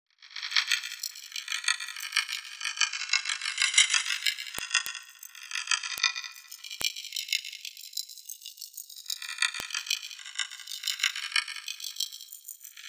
Tirks Plate; Sonic Foundry (Sony Creative Software) - Future Retro Dance Excursions

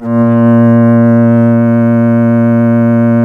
Synclav FM STRINGS A#; Big Fish Audio

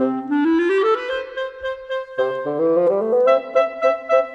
Cinematic Winds; Sonic Foundry (Sony Creative Software); Orchestral Series VOL-3

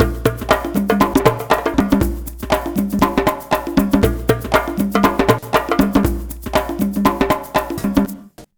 Modern Percussion Loops, MPC60 119; Soundscan vol.56; AKAI

